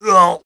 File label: hit; BattleSNDS